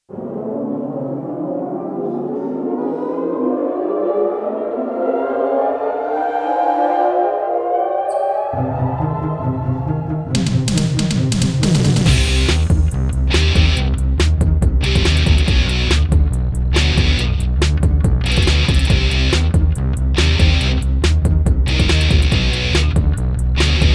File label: Haunted Beats